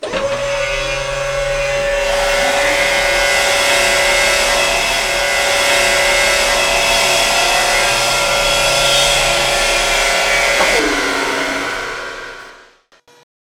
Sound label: meat sawing